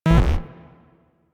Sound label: Corrupted Notes R; Warframe; Bard Quest; Bard Boss Fight